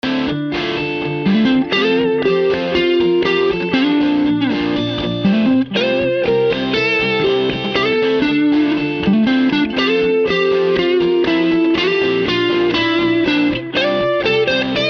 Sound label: Wampler Ecstasy Tel Ecstasy